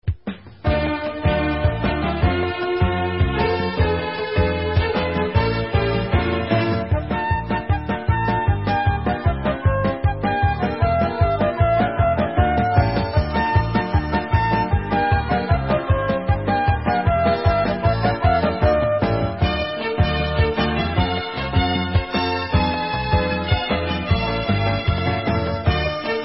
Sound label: Instrumental